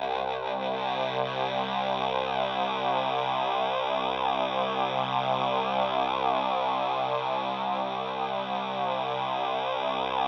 C2, Synth Leads

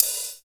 OP HAT

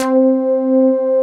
JV BASSIC; Syntec; Wall of Sounds

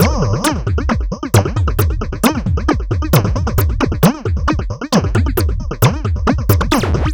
Drums Strange

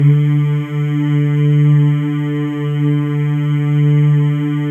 MALE MMS, Symphony of Voices; Spectrasonics

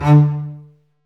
STR, strings; Roland - LA Composer vol. 1

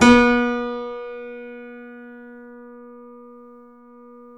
C7 FF, The Ultimate Piano Collection; East West

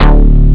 bass loop synth attact; jazz jackrabbit 1; M8

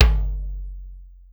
Tight Tom Lo, Clavia DDrum AT, Pitched Down

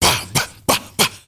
MALE RAVE, MALE NOISE, Heart of Africa

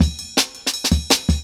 Jungle Breaks

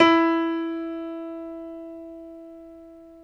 PNO Grand Pno; Club-50 - Foundations Roland

